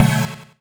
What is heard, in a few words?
Stab B2, Club Techno; Zero-G; Stabs